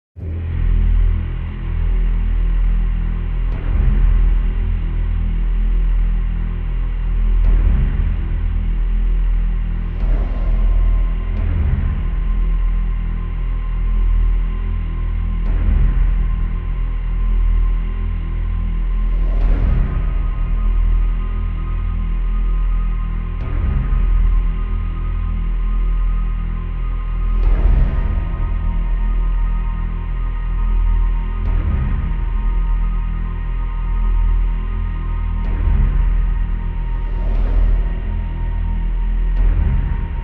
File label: Drone Musical Evolving Dark Pulsing, No Loop; Drone Dark Suspense Eerie High Strings Texture Bed Atmosphere Ambience Pulsing; Drones; Sound Design